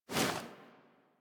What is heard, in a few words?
Generic Weapon Aim Transition Out; Warframe; Weapons